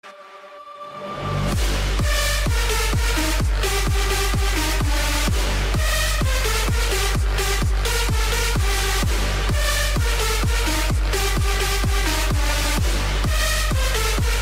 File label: edm ringtone